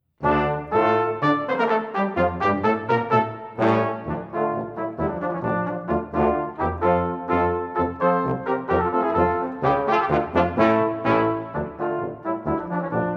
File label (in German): Ungarischer Marsch